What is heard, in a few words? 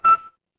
ui alert